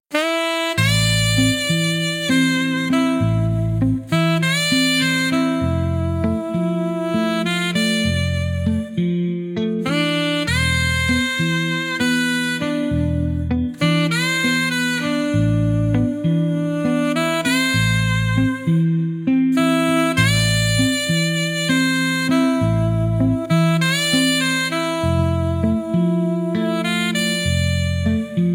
sax

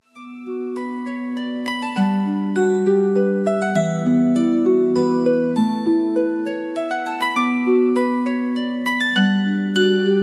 celtic harp

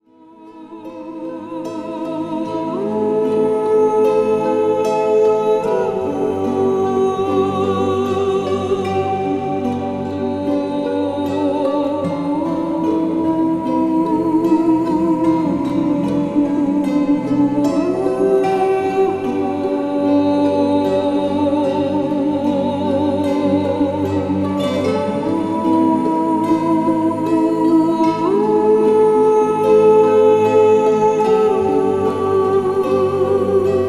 angelic voice

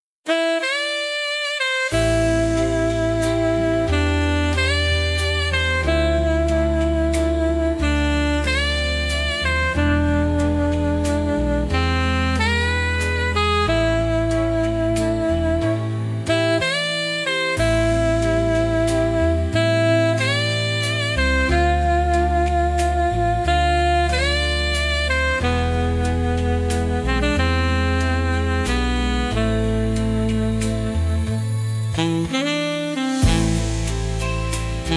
saxophone horizons

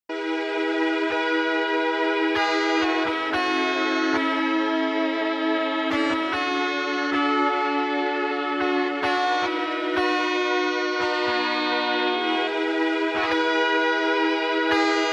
spa music 432 Hz; podroz

